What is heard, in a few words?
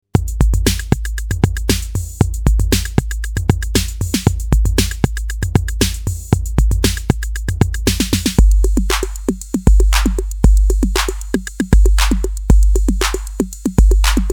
drumatix beat jam